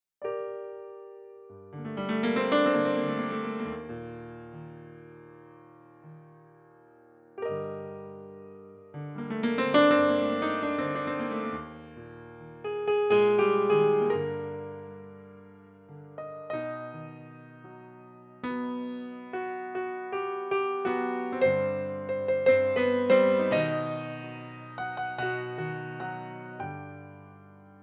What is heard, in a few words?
Steinway Improv; NI AKP